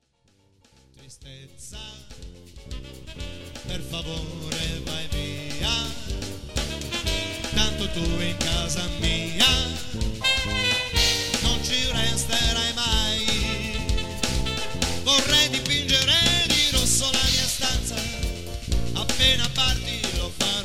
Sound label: SAMBA